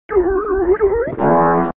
brain fart lowpass

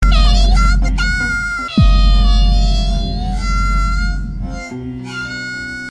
hehe

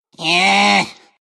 anger; alchemist

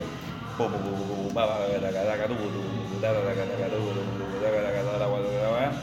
tucutucutacataca

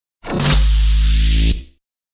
glitch out

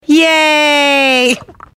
yaay

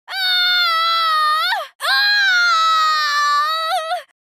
extreme boost k o scream; mkx; cassie cage

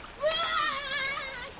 aaaaaah

